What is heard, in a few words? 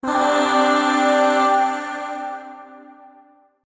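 Shawzin Pentatonic Major Chord; Warframe; Zariman; Void Shawzin; Chords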